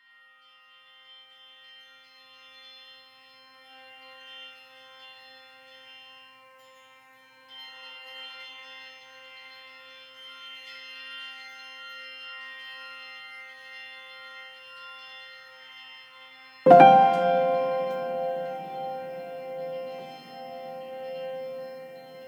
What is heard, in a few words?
Thunder; Kensho